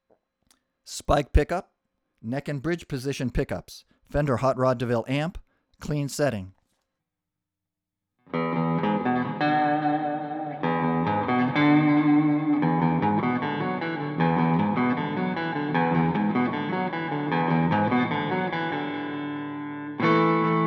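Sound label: neck bridge hot clean, guitar pickup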